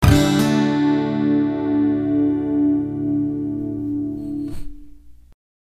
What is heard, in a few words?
Punchy D